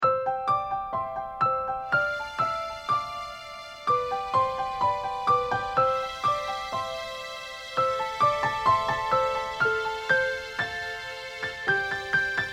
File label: Song, FI